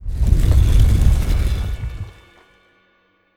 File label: bonus To Main Game Transition; netent